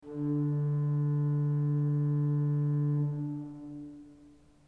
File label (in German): ton, orgel